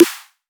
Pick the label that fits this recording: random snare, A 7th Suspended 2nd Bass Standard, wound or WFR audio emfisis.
random snare